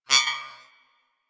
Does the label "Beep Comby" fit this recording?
Yes